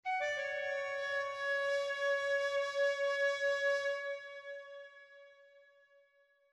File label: calliope flute; God of War Chains of Olympus; psp